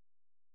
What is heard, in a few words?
C#, Synclav FM, FX; Big Fish Audio